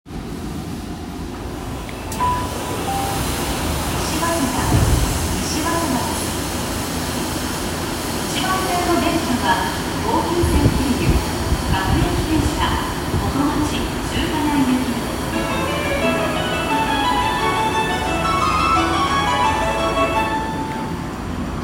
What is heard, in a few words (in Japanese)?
副都心線西早稲田南